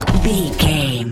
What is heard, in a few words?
car window open close whir